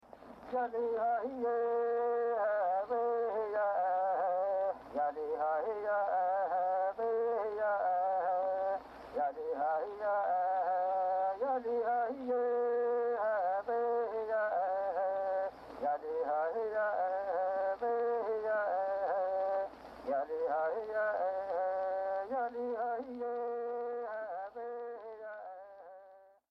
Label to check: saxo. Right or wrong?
Wrong